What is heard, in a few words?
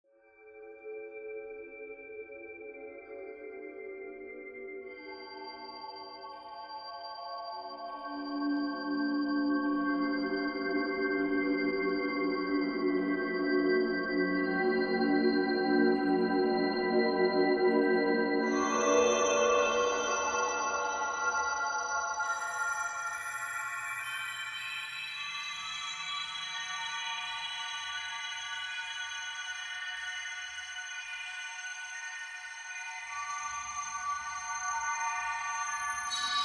Altus